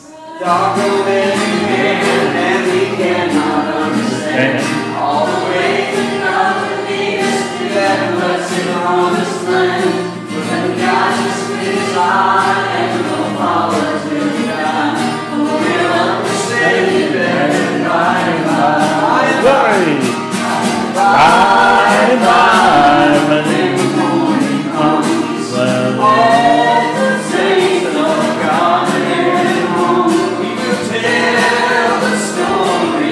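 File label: Hymn, church service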